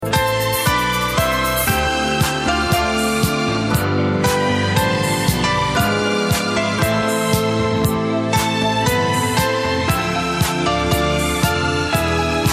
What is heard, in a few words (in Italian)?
Suonata per pianoforte